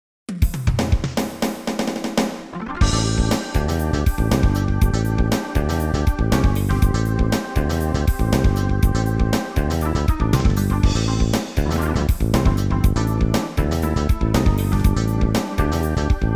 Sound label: Stormy Saxophone; The King of Fighters Kyo